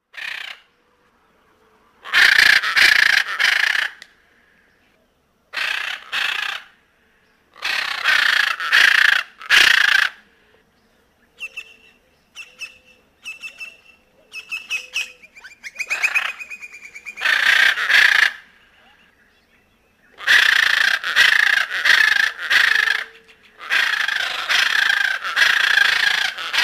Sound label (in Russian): golos giatsintovogo ara